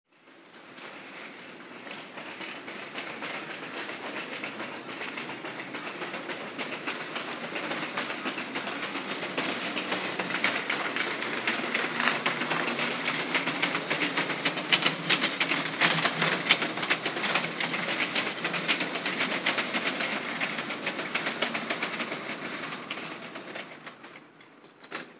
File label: early deliveries